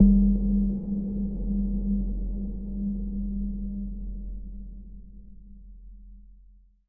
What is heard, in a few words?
bloop; hl2dm; oppressive territory